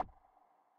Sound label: click; gnome-control-center